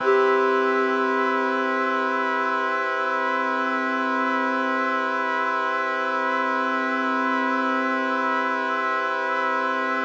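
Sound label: Mixed Winds C major 7, synth orchestra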